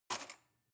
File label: cutlery cake fork drawer grab hard